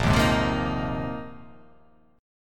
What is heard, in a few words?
A sharp 7th Suspended 2nd Suspended 4th